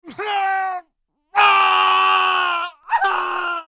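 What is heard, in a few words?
death and pain sounds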